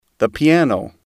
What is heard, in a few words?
piano